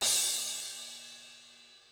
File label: Overlay Crash Small 125bpm; battlefield hardline; ps3 ps4 windows xbox 360 xbox one